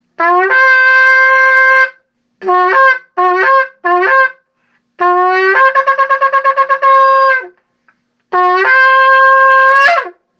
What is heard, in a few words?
Shofar